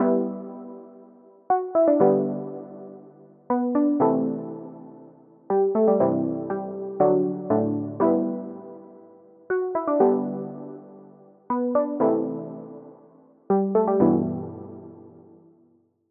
Key

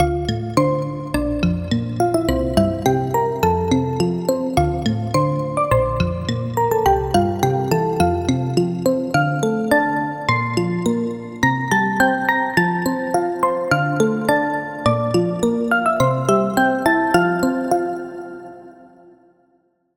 Bell; Angel Box; Routed Sound; Vital Expansion - Story Walker Vol.1

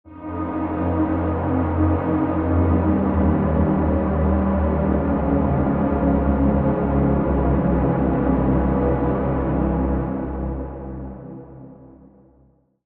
Pad; Hidden Danger; Routed Sound; Vital Expansion - Noble Gangster Vol.1

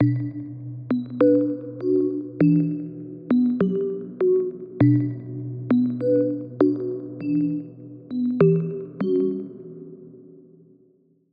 Pluck, Spire; Routed Sound; Spire Expansion - Street Vanquisher Vol.1

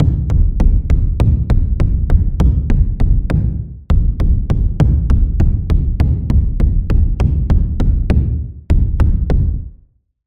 Impact War Drum; Sylenth1; Routed Sound; Sylenth1 Expansion - Quantum Enforcer Vol.1

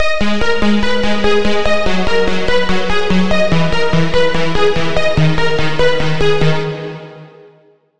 Lead, Vintage Texture; Routed Sound; Vital Expansion - Reality Maker Vol.1

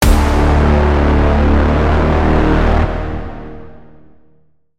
Braam; Braam - Inception; Routed Sound; Serum 2 Expansion - Quantum Enforcer Vol.1

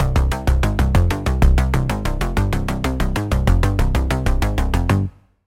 Bass, Sylenth1

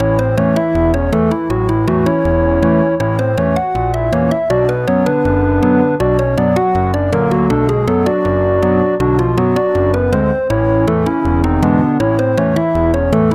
Key, Sylenth1; Key - Old Town; Routed Sound; Sylenth1 Expansion - Story Walker Vol.1

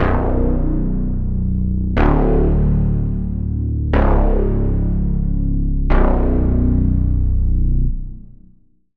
Reese

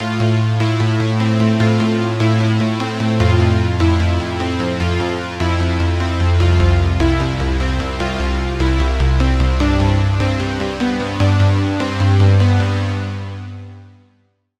Pluck; Routed Sound; Sylenth1 Expansion - Reality Maker Vol.1

